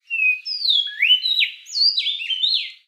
bird, ambient animal

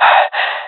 death, swat; vo; Counter Strike Model Pack; CS:GO; swat epic

